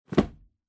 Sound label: Cardboard Box (Put Down); ダンボール02-10(置く); 効果音＞梱包材